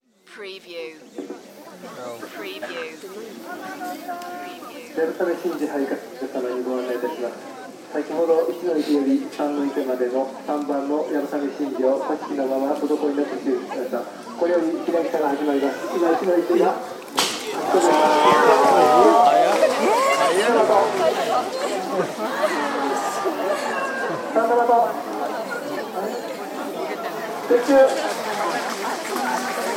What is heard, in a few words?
yabusame festival crowd cheers applause Kamakura Japan